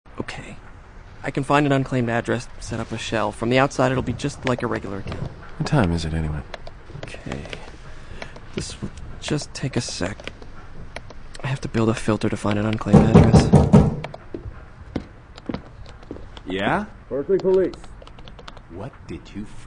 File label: shock sticks